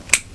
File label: nail clippers